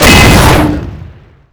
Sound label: tiger engine destroy main gun; WAR